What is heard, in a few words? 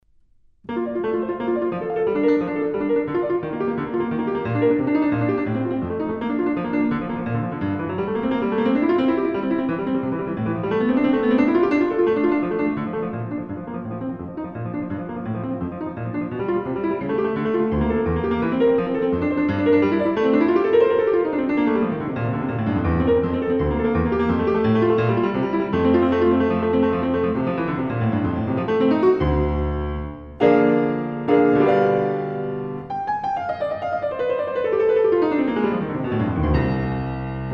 Prelude and Fugue in B-flat Major; bach